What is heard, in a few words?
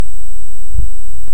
Hydrophone; OneOcean